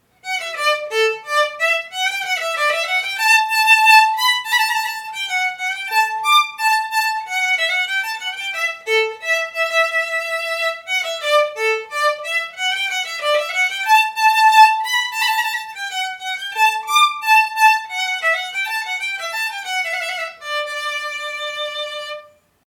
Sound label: Rondeau, Violin; Moureat; Kathleen Bloom